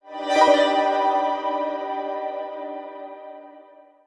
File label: sting box flippy switch